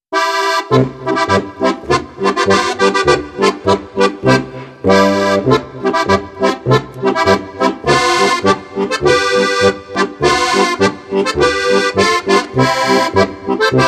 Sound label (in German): polka